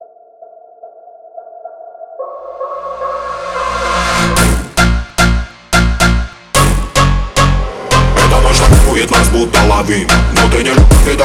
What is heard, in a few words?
Phonk Remix